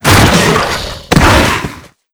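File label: angel warrior death, monster; lava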